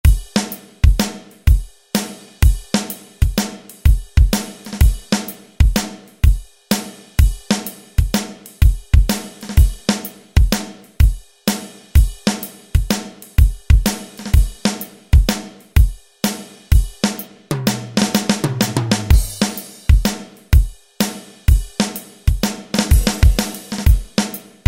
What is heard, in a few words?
Jazz, Alesis SR-16; Preset Patterns